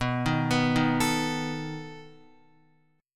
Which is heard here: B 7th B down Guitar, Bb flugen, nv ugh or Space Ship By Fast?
B 7th B down Guitar